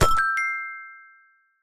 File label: game show quiz bonus pick